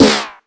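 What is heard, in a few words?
127 drum 2 L, Fresh Disco House; USB Soundscan vol.20; AKAI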